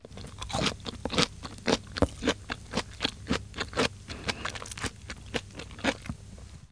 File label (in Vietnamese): Am thanh an dua hau trai cay